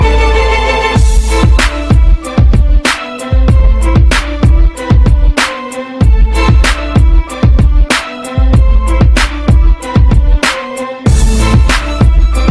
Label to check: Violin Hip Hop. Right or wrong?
Right